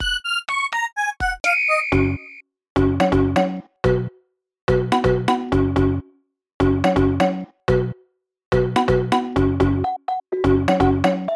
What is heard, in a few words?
(Instrumental)